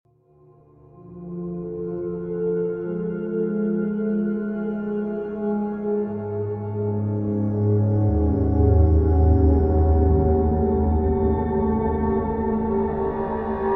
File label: Slow Atmosphere